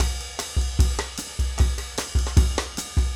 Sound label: samba 150